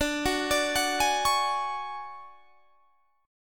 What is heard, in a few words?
D Major 7th Flat 5th D down, chord; DM7b5 arpeggiated